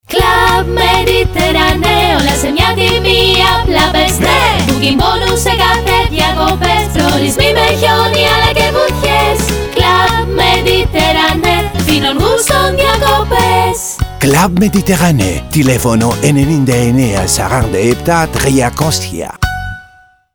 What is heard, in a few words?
jingle; CLUB MED